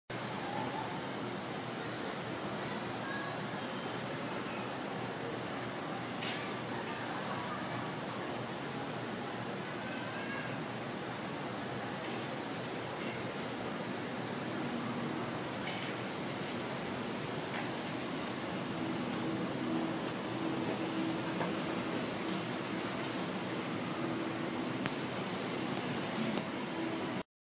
olive-backed sunbird

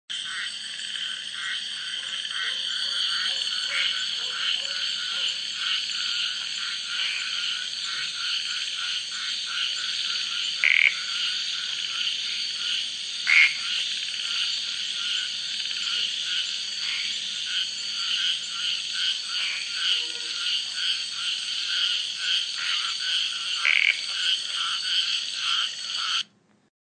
frog polypedates

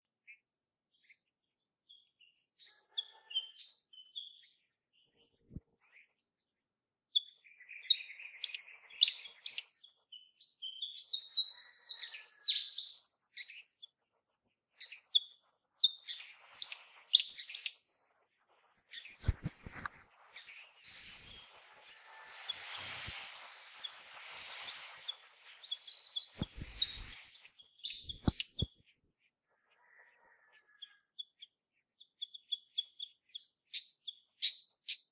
passer

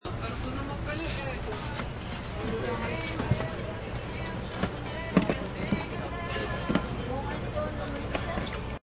pause between blender

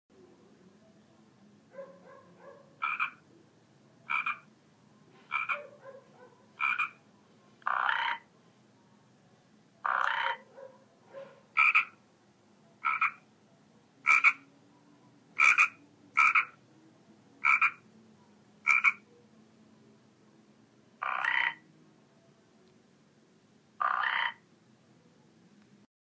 frog rana

